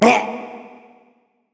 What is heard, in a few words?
Death; Tiberius; Paladins